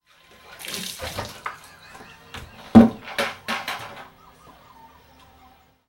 splash and laugh

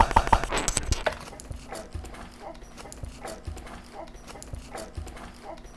pop marble

